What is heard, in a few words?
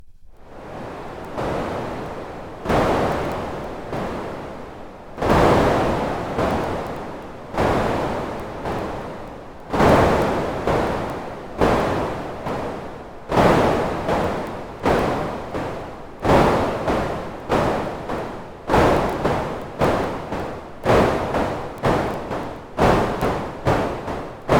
train